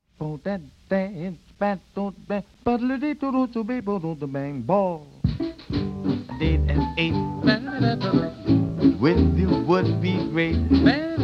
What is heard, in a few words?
Slim Slam Rhythm